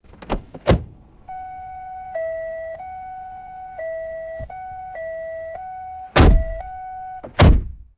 car door opens and slams shut with chime; vehicles

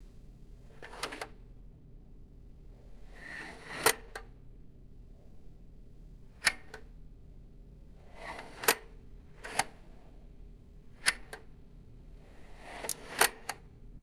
plastic helmet visor sliding closed; foley

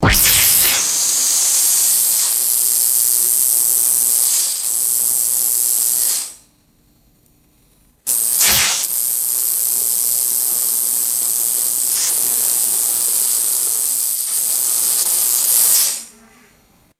snappy electrical taser zaps and bursts; electricity

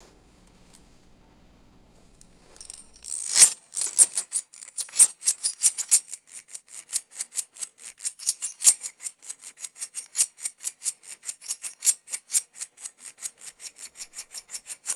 rhythmic metallic chain and quickdraw clinking

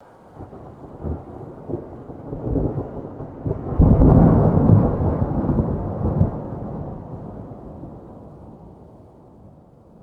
distant rolling thunder rumble

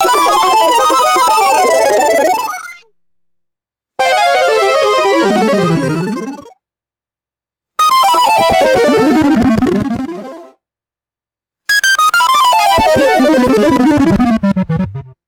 retro video game descending falling synth; cartoon

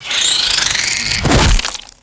guillotine blade drop with wet gore impact; weapons

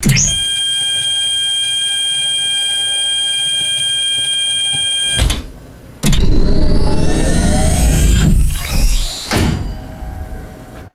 sci fi airlock door closing with alarm; magic-scifi

